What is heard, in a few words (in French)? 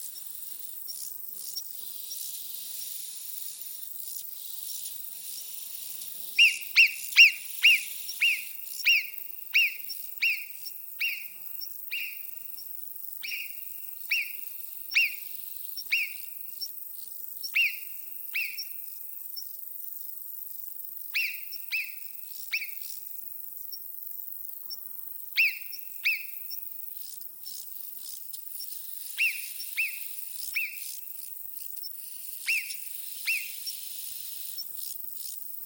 marmotte; Cris d'alarme; alpages; F. Deroussen, PNF; Parc national des Ecrins